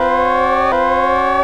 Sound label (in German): SIRENE; SkyChat 3 0